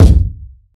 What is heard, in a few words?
subtle reverb bass drum single hit c key